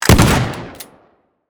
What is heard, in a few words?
shotgun; teardown ps5 windows xbox series xs gamerip 2022